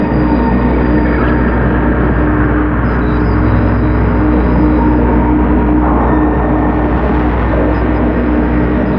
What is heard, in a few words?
reactor hum